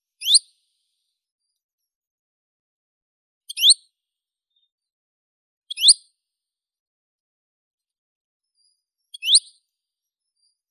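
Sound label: chiffchaff, Phylloscopus collybita; penochka tenkovka; zvuki prirodi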